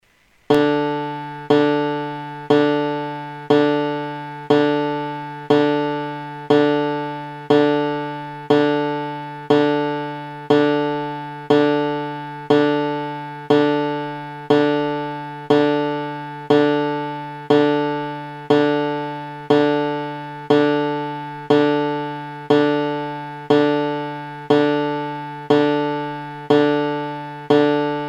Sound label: D3 Banjo